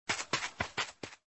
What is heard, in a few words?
Scurry Sound